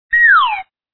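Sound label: slide down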